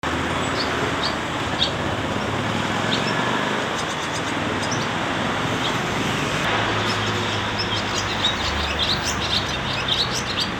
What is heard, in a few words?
swallow